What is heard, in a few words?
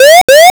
warning